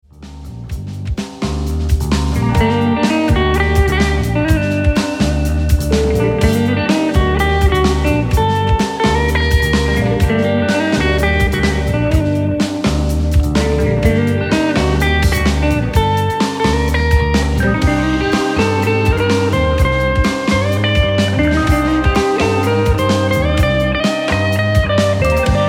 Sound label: Tango, instrumental